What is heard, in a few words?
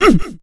"Mmh"